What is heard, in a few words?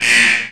Klaxxon